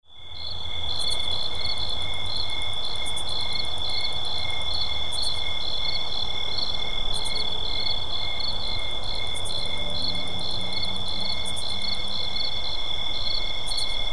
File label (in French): silence de la nuit